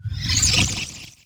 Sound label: Teleporter Nebula Cloud Exit; Evolve; Wraith